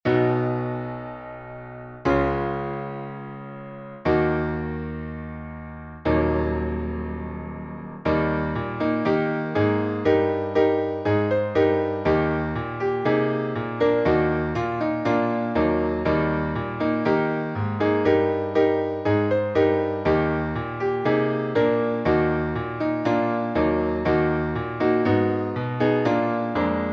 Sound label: Afghan National Anthem